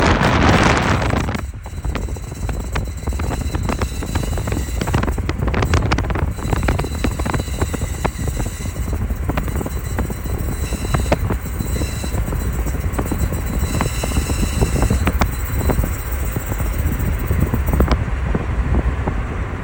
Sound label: awful noise just started 2005